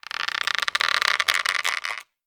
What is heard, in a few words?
Tree Squeak; moul